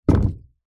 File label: wooden box crate set down hard surface; foley; zapsplat